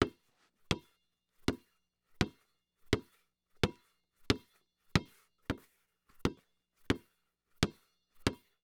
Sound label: Sports Ball Basketball Dribble On Wooden Floor